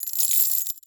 Coins Drop; SFX Producer

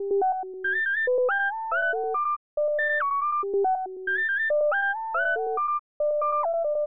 lead

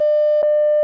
ping short ahh

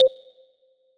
ui Move

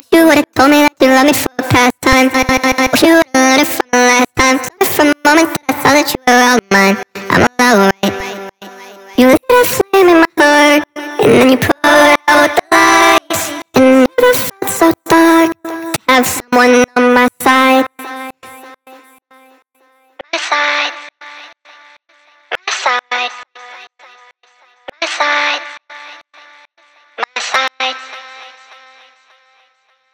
Sidechained Vocal

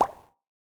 bubble form

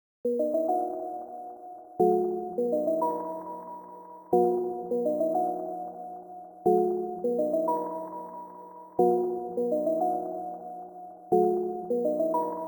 chords; ytpmv

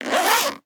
Zipper Unzip; SFX Producer

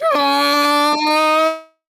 the crash

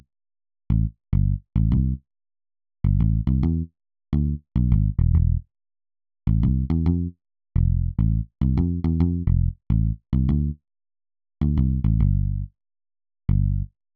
bass

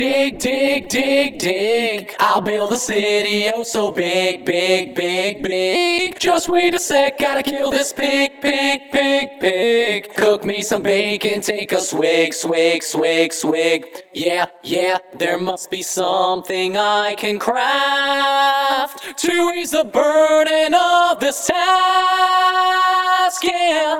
Vocals

